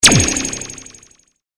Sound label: Laser Gun